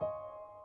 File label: piano high